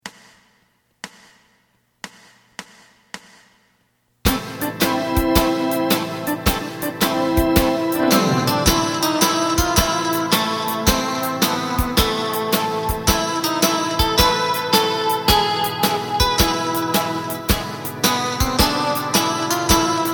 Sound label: bass